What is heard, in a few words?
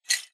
imp bullet lightballistic tile debris